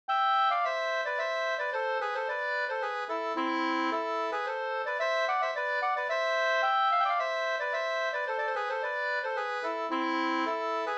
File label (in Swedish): polska, 2st; e Halleforsnasarn Sd